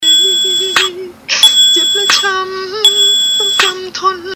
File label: cartoon